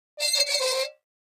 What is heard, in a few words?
Metal Scrape On Metal